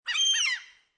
Single Seagull Call, A single sea gull calls with another gull cackling in, no ocean waves; no ocean waves or any other background noise; Bird Sound Effects; Animals; Lynne Music